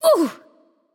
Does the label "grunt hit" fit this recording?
Yes